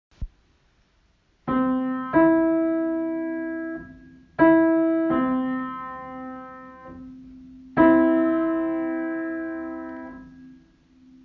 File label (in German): Gr. Terz